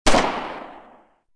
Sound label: GUN, crime; zones